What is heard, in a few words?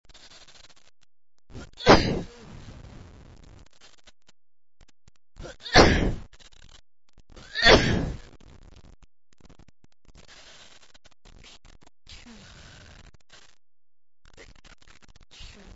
cold, male